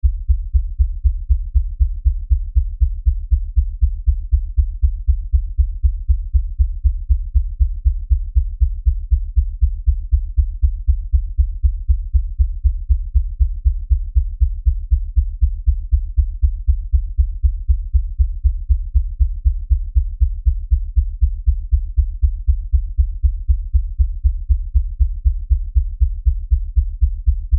Lfe Pulse Low Bass; Drone; Sound Design

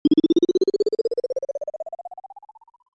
Rise up, Rise Up SFX, Short; Miscellaneous Toy Sound Effects; Miscellaneous Toy (Sound Effects:Toys); Stuart Duffield

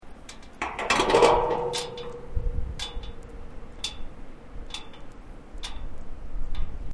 Metal Creak Soft; Material components to create more complex sounds; Materials

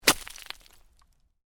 Ice impact lite, Ice: light impact with debris; Snow; Weather; Snow Sound Effects; Snow (Sound Effects:Weather); Stuart Duffield

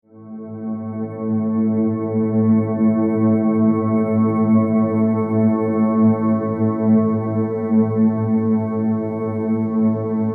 Ambient Soundscape, science fiction; The Dawn Of Time

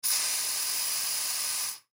Oxygen Tank, Oxygen Tank Release Air; Hospital; Emergency

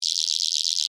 Insect Voice Chirp, Vocalization, Bug; Animal; Rattle; BlastWave

